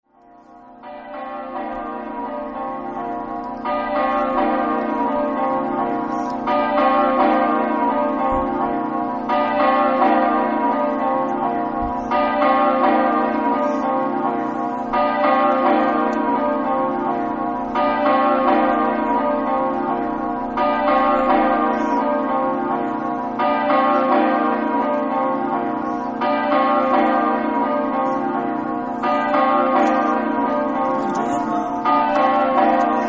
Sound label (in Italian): liessa, campane